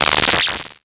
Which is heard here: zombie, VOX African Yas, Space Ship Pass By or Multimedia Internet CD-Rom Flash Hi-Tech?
Multimedia Internet CD-Rom Flash Hi-Tech